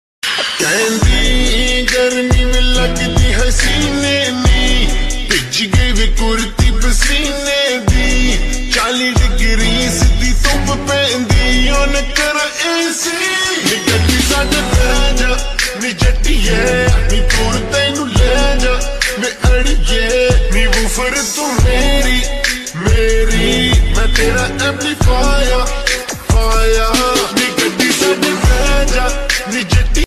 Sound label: song ringtone